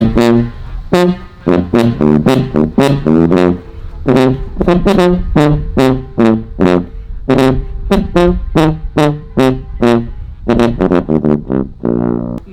fragment sousafon

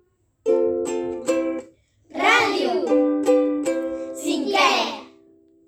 Sintonia Radio

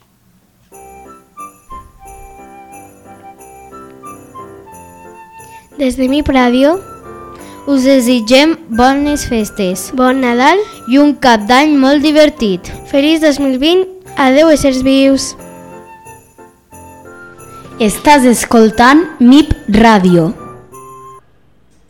jingle nadal

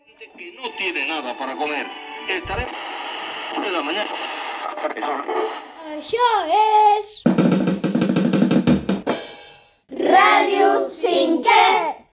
SINTONIA

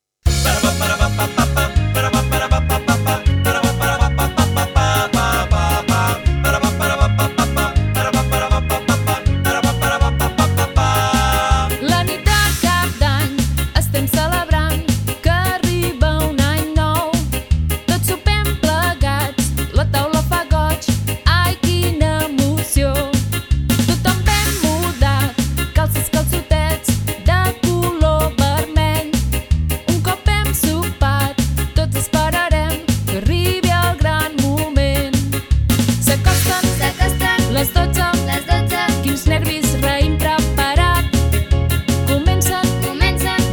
Les campanes

